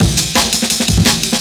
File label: storm amen